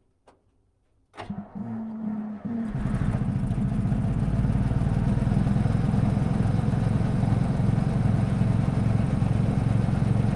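zil131 onboard start fast drive stop reverse stop drive stop off engine; mix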